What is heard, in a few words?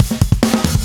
Fill 140 BPM; Vengeance Packs; vengeance essential club sounds-3; VEC3 Loops 140 BPM; VEC3 Fills 140 BPM